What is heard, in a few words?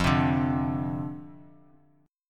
F 5th 6th F, chord; strummed